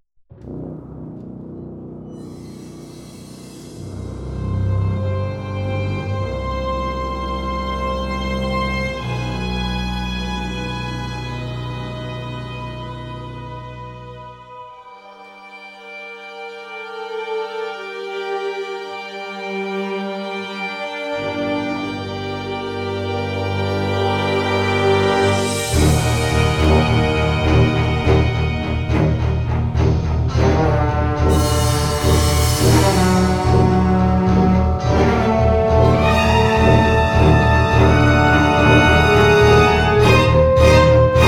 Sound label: Heavy Metal